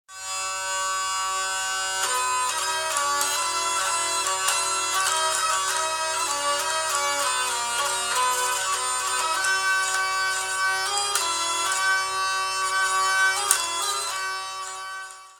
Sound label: hurdy gurdy